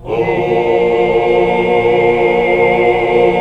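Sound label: chord 2 6 R; Best Service Gigapack 1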